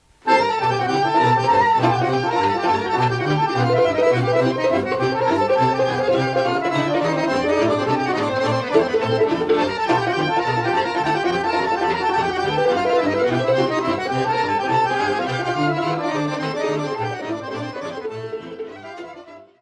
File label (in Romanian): Sirba, Roumanie; Sirba Batrinilor